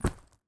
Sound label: on off bag